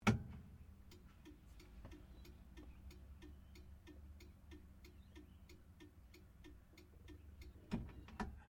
CAR HOLDEN HD PREMIER INDICATORS, Classic Australian cars